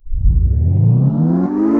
Tape Start

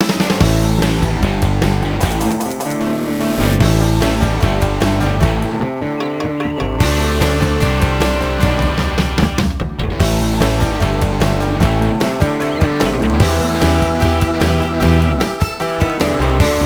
Desert Character Intro

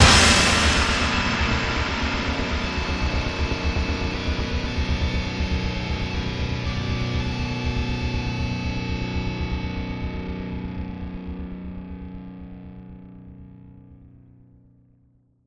GTR END

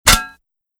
bullet hit tin; Shattered Horizon

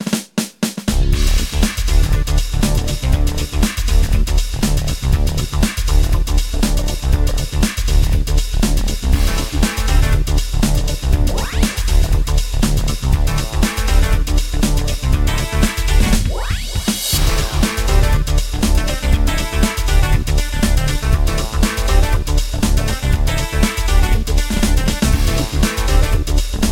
BGM shop

